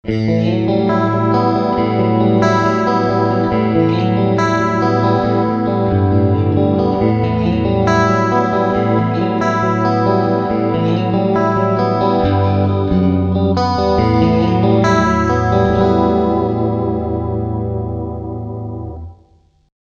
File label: Liquid Clean